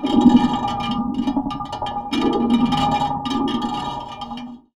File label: Moog LPF & Delay, contact mic, Tin Of Guitar Strings; musicradar; contact mic madness